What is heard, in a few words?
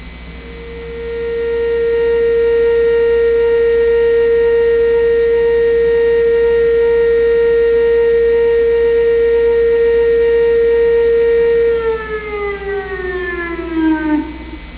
Siren weather; police